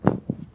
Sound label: Player Walk footsteps mat Walk